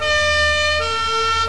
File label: police